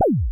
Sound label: TR kit, techno kit; Pro-Rec - Synthsonic